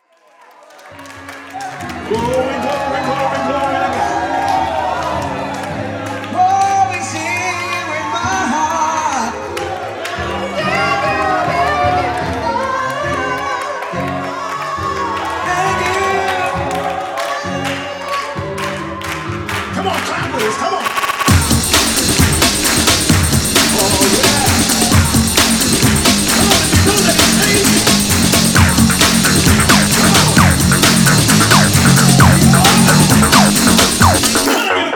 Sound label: Big Beat